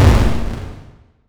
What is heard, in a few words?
Retro Impact